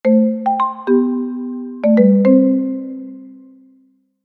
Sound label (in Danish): jingle kort